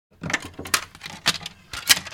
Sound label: CD or DVD inserting a disc into player